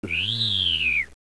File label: Drill